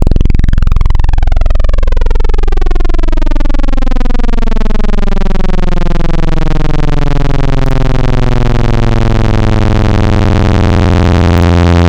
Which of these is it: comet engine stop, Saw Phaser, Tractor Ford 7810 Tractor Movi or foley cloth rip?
Saw Phaser